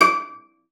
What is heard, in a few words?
CELLO FINGER; 90 s Sample CDs; Xsample VOL-5